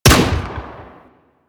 Thumper Bot Shotgun Fire B; Warframe; Grineer

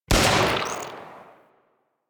Shot; Warframe; Infested

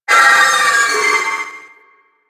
Trooper Vocal Death; Warframe; Sentients

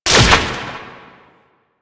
Orb Destroy; Warframe; Duviri; Conservation

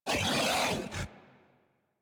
Feral Vocal Melee Attack; Warframe; Kubrow

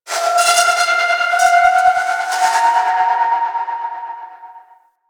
Asteroid Metal Groans And Scrapes; Warframe; Grineer Asteroid

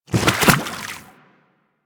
Infested Small Land Water, Footsteps; Warframe

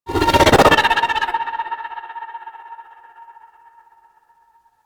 Focus Operator Cast End; Warframe

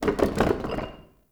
Witch Station Workshop Rattle; Ambience; Soul Plane

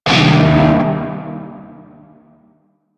Shawzin Blues Chord; Warframe; Narmer Shawzin

